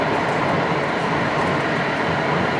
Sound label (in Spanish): paso Recta; TMB-S9000; OpenBVE; Train